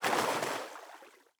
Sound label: footstep water land; Rust